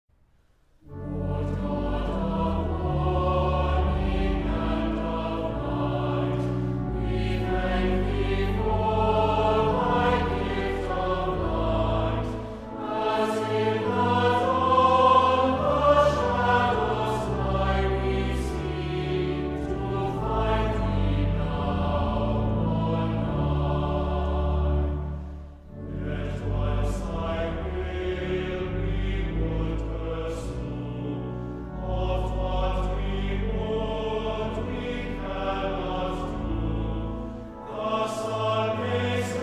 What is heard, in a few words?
choir